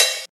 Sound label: hot open high hat sample e key